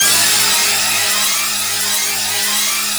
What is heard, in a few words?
G#3 TREM; Northstar; The Wizard VOL-1; SCORPION